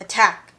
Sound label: attack